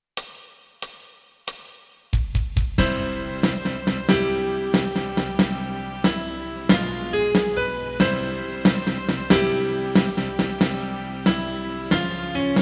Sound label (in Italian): Piano Tutti